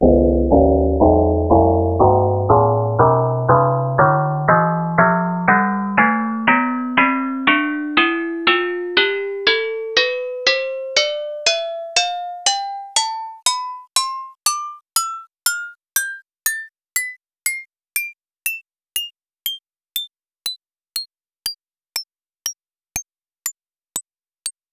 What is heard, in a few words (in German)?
agogo tonleiter